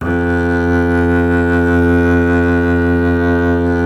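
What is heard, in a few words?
string contrabass F0; Miroslav Vitous - Solo Instruments VOL-2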